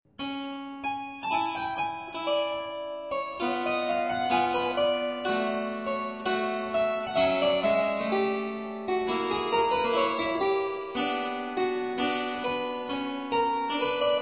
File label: Variatio 26 a 2 Clav.